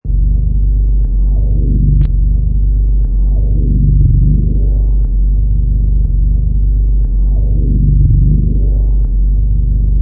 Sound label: Frequency Bass, Deep and creepy evil bass sound LOW FREQUENCIES; Deep Tones